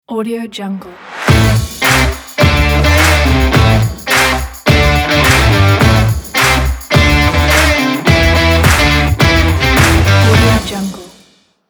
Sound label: upbeat stylish indie rock logo